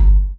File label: Tails, Drum Bits, Verb Tails